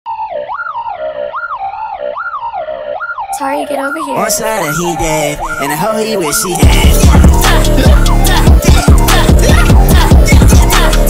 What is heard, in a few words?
sped up